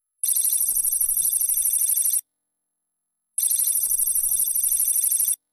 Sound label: Digital Counting